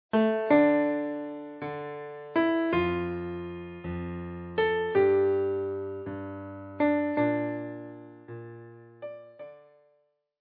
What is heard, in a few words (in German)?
The House of the Rising Sun, Klavier